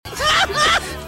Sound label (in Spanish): risa; peligro sin codificar